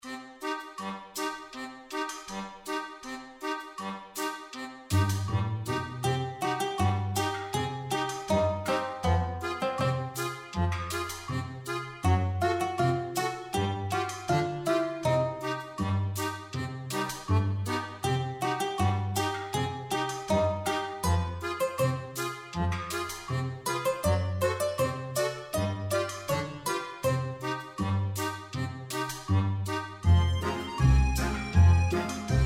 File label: chicken kitchen